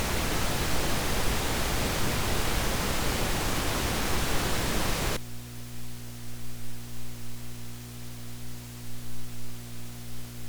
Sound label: PAS3X noise